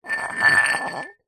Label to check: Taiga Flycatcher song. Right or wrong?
Wrong